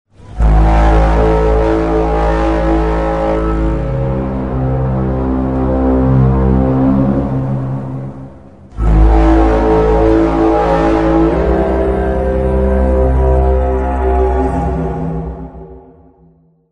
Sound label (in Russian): zvuki inoplanetjan